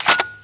xm2010 clipout

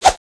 dragontail slash

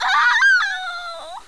f die

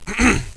cough; bot; cooked

